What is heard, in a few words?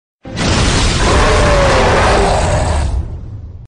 Roar; Hulk